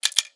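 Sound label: free spins coin land tone; netent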